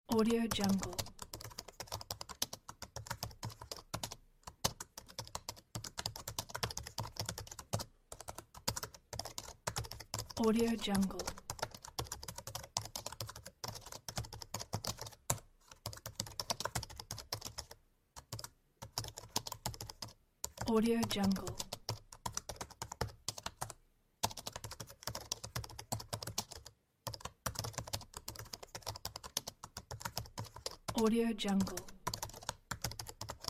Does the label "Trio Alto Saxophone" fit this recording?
No